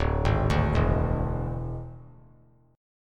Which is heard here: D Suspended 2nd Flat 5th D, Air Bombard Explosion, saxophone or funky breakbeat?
D Suspended 2nd Flat 5th D